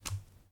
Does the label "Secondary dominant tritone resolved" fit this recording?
No